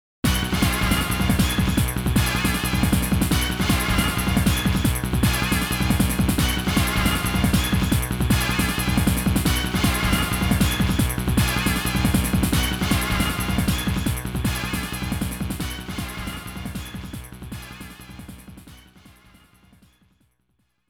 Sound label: Continue; marvel super heroes arcade gametrack 1995